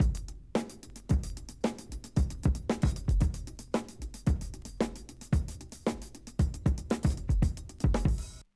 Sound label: drum break; hip hop beat battle 19 loosely rock themed